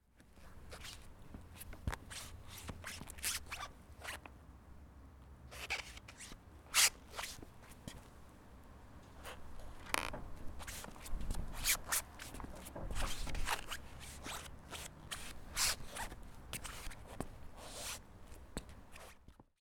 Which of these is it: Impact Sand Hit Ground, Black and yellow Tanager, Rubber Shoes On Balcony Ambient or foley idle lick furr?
Rubber Shoes On Balcony Ambient